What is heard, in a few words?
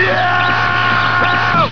Yeowwww